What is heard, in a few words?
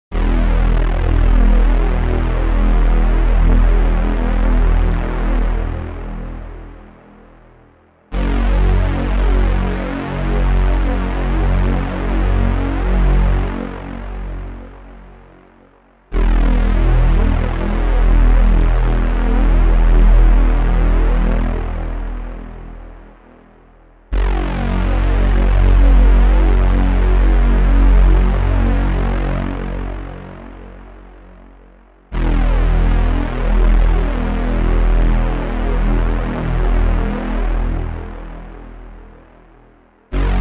SYNTH